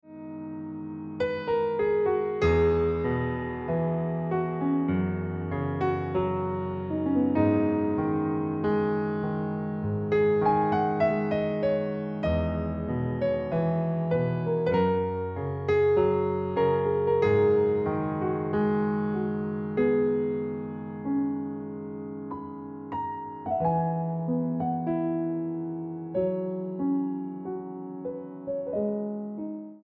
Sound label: Ringtone Piano Music